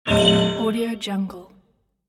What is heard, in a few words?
robot error signal design